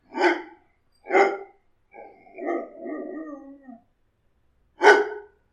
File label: talking dog, animals